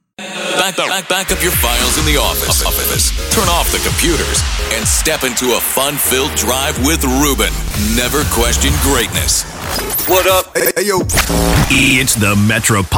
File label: DRIVE TIME SHOW JINGLES